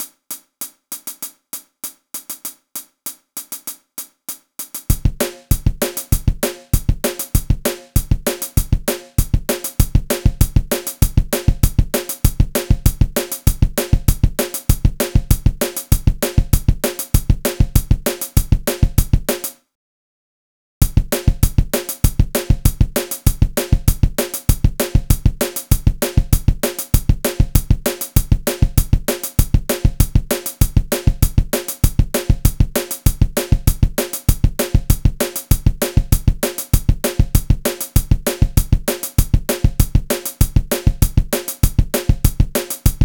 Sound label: drum; high; These are some experiments I made using GNU/Linux to produce simple drum and bass tracks